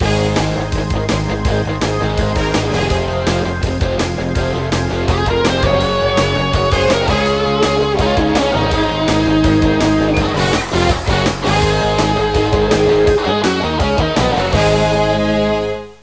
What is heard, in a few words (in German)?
Orgel; windows gamerip 1997; Axelerator